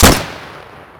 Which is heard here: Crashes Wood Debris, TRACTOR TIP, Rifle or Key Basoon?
Rifle